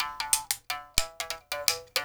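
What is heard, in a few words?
SM MOUTH BOW; Spectrasonics; Heart of Africa 2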